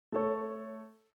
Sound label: Closing Sound, Nokia N92